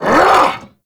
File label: Vocal Attack Short; Warframe; Duviri; Dax